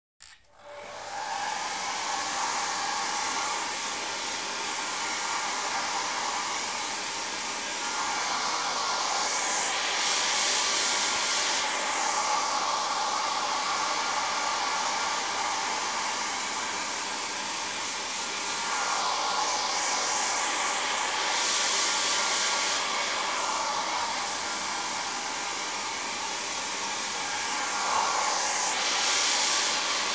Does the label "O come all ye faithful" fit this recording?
No